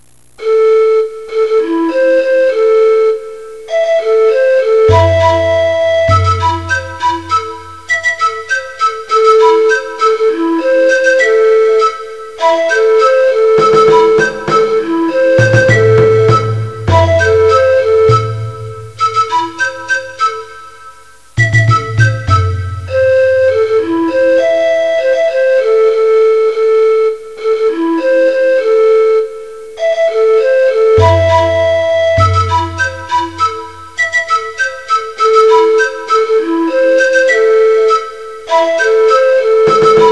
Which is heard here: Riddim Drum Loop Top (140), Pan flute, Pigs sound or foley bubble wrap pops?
Pan flute